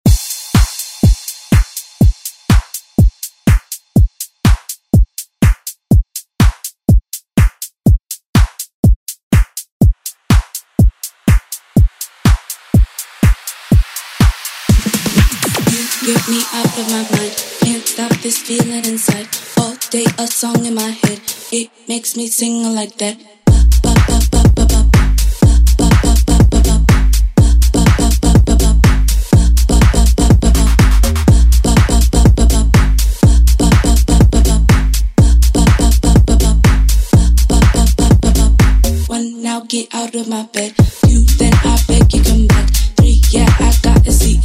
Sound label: Re Drum; DJ Mhark